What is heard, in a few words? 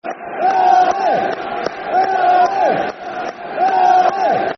Red Army, Red Army, Red Army, Football Chant (Soccer Song); Doncaster Rovers Drfc